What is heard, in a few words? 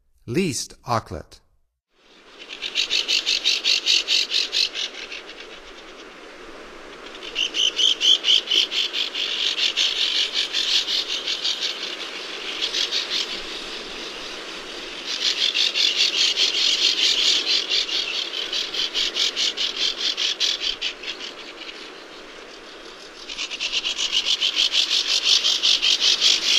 Least Auklet; North America; Stokes